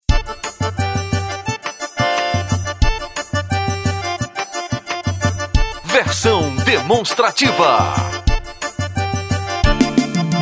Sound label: JINGLE; HOMERO NOVAES